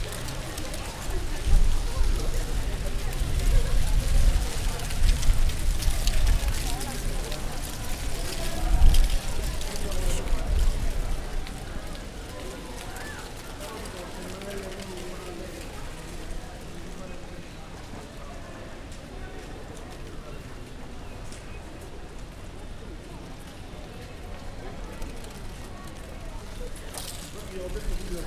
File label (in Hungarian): falevél szélfújta diákok